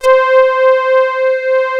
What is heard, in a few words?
SAW LEAD; PPG; analog; USB Soundscan vol.49; Analog Fantasies; AKAI